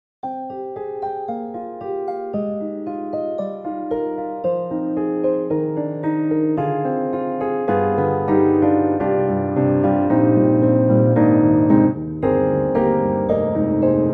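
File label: Tuned Metal Plate, piano; Steinberg HALion 7; Verve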